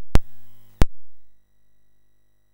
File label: Commodore 64 Christmas BASIC MOS6581R3; Commodore 64 Christmas Album